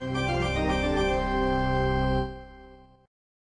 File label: hard task complete; Morytania